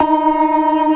ORGAN, C3 NO PERC; Keith Emerson Signature Series

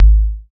BD, Synth; Sound & Vision - Gigapack II CD 2

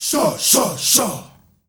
SHAWSHAW R, GRP CHANT, Heart of Africa; Spectrasonics; Heart of Africa 1

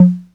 808, CONGA, Electronic; Wizoo - Platinum 24 Electronic; CONGA MENU

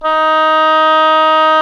OBOE, Orchestral Winds, Oboe mf f

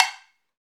CowBells

